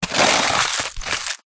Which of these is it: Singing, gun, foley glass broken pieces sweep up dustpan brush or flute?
foley glass broken pieces sweep up dustpan brush